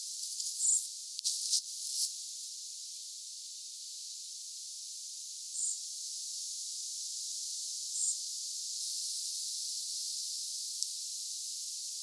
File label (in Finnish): naaras sinihohtorastas